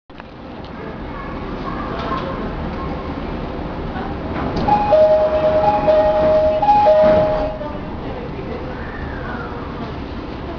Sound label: e501 igbt; akatsuka-uchihara